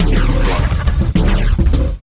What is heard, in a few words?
Bumpers; Sonic Foundry (Sony Creative Software); Esoterik Beatz; Shaj Fx